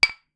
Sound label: glass; Phasmophobia